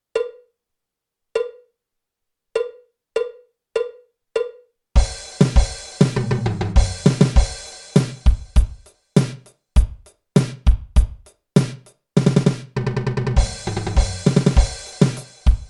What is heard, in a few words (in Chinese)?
架子鼓独奏 芬克摇滚风格; 66条架子鼓独奏SOLO